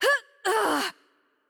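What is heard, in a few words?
Hurt Heavy, Italian; bloom minigame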